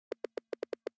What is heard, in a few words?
Typing; blue archive